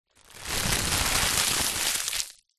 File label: packet plastic crisps multipack empty scrunch up in hands; foley